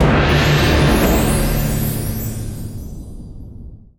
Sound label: stacked Wild Attention; wild turkey